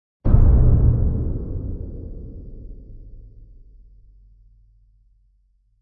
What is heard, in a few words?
Prodigal Hit Sub